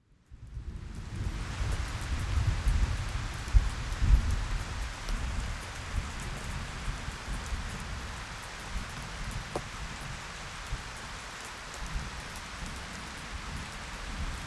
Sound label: nature sound bath thunder